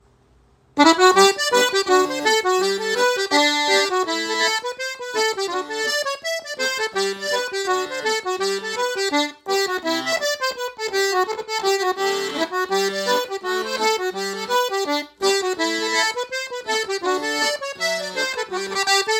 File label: Hornpipe